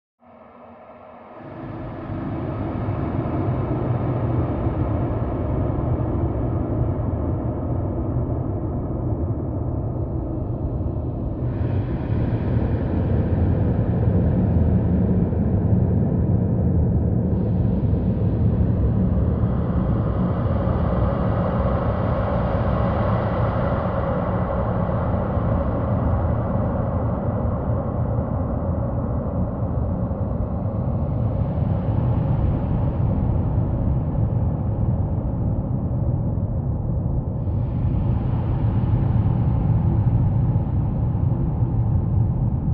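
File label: Haunted House Ambiance, ghost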